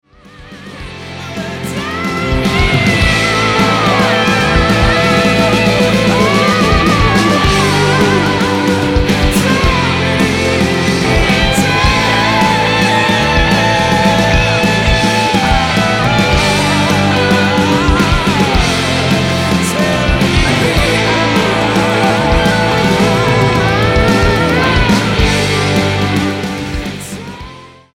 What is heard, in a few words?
Electric sample